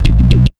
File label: FX, Big Beat Drumloops; PHASE 130; USB Soundscan vol.25; AKAI